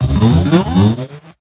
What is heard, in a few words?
talk